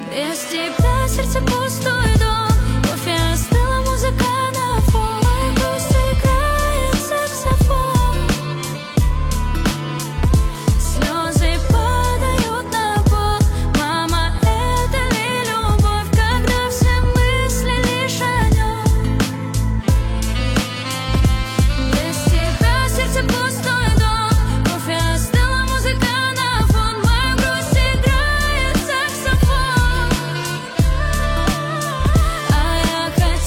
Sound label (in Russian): grustnyj saksofon